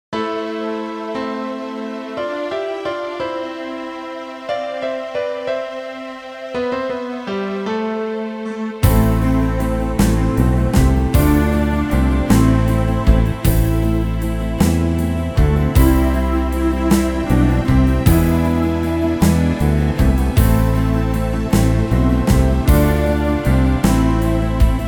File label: MIDI